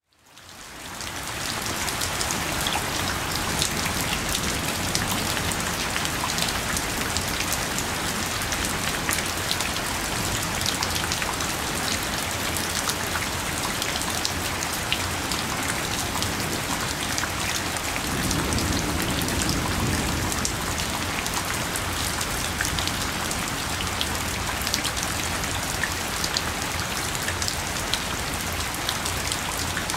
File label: Rain Sounds Mountain Rain; Natural Sound Collection